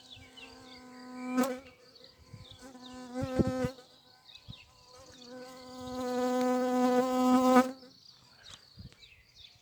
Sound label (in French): Abeille top; Vols Bourdn abeilles